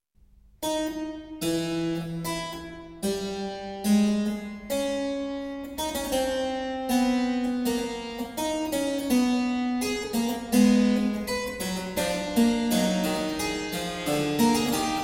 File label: fuga